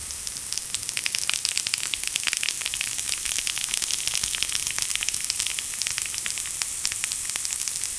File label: thick steak sizzling in a; bark